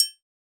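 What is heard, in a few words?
Hip-Hop Kit oldschool closed hi-hat; Roland Juno-D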